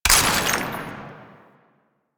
Ammo Pickup Blast; Ammo Ability; DE; Warframe; Frumentarius

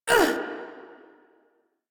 Pain; Priest Quest; Palladino

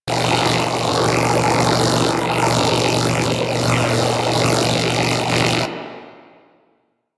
Iron Blast Charge Loop; Warframe; Warframes

